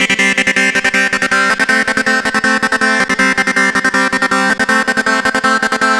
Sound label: Dance Synths, Hardcore Loops, Synth Loops; Best Service ProSamples vol.37